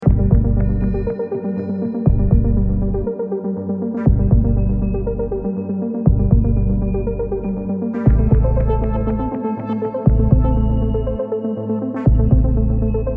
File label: cabin video game theme